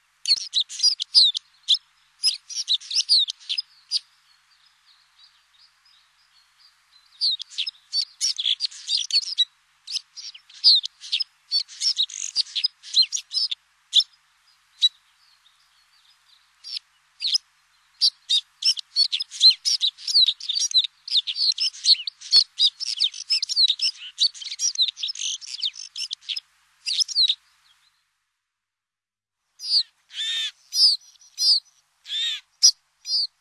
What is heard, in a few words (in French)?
Niverolle des Alpes; birds